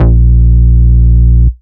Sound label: Root A#, Ultimate Bass; Vengeance Samples